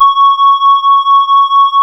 C#, Synclav FM, WIND; Big Fish Audio

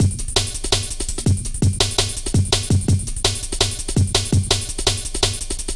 Drum Loops, Jungle

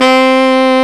SAX C3

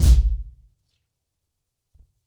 90BPM, acoustic drums; The Roots Drumloops

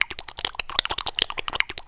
CHEEK JIGGLE; Rarefaction - Poke in The Ear With A Sharp Stick

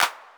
Miami Clap, drum machine; AcidLab Miami

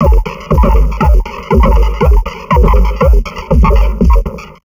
Drum Loops Crazy Processed

